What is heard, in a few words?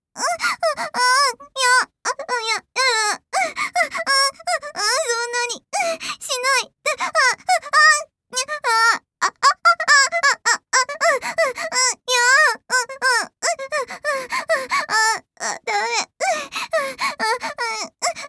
gasping hard